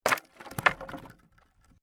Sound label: Wood Junk Crash, Crate of wood impacts with pieces of wood; LYN100 package of Stone & Wood sounds; Wood Sound Effects; LynneMusic